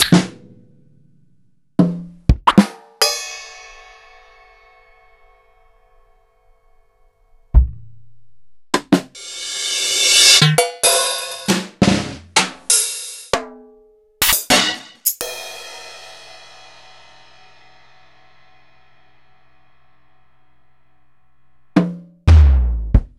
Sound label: EMU PX-7; m8